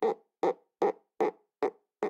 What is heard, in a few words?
Insect Weird